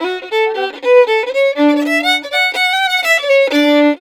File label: violin, Celtic Flavours; USB Soundscan vol.42; AKAI